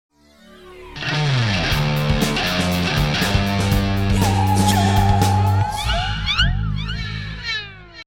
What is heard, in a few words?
Theme Sting; Yewells Jewells